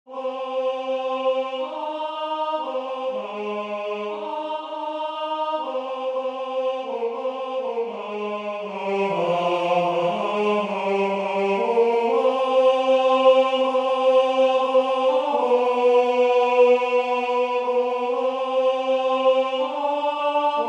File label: blow blow thou winter wind tenor